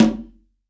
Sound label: CONGA; STEREO CONGA; Cuba